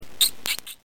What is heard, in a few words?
squirrel